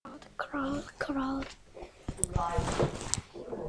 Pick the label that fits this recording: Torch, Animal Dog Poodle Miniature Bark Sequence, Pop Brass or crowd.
crowd